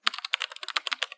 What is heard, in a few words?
keyboard typing